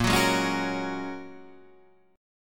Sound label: Ab 7th Suspended 2nd Suspended 4th, chord